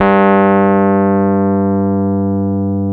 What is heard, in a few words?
G 1, Electric Pianos; Wizoo Electric Pianos